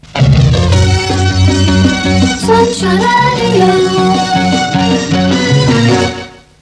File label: sun Shine Jingle; SunshineJingles